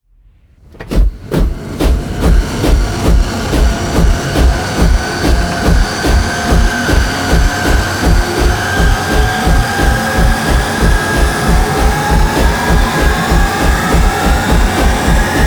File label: riser train hell